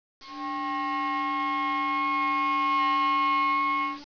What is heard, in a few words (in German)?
dreifuenfklang klein, multiphonic